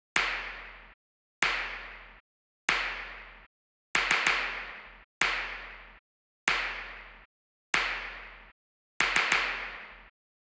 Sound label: Dry Claps